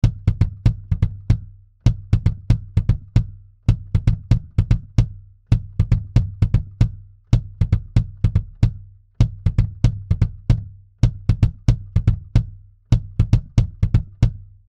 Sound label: Preamp BD 30 dB 300 Ohm; 1073 Preamp Vergleich; Neve 1073DPA